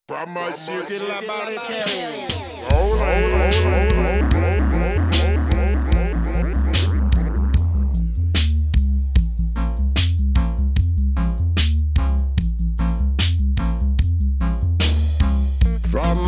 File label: dub remix